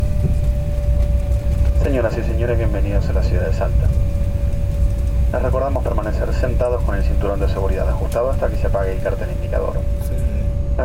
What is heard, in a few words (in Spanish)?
Aterrizaje en Salta flaps aterrizaje